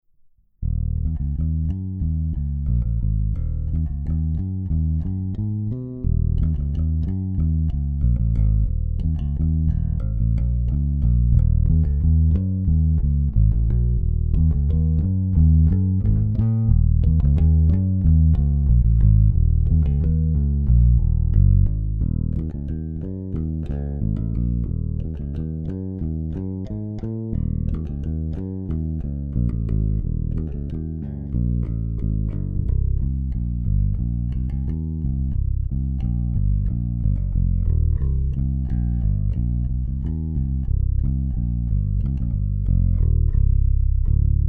Finger Mittig Hals Brücke Bass Boost

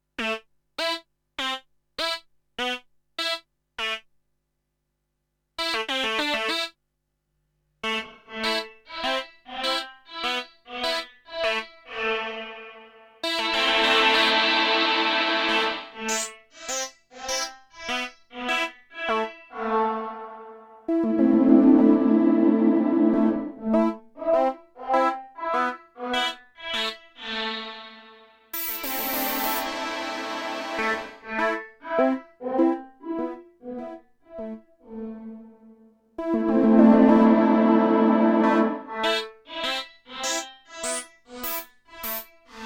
Modal Sequenzer Plate und Modulation

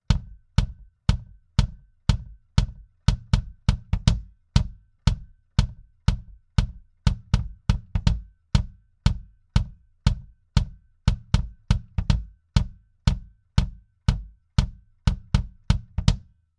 API Audio 2500 Bass Drum ohne Kompressor

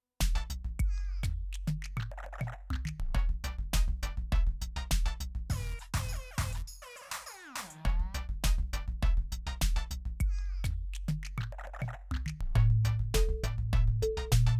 Volca Mix JAM Cinch Ausgang

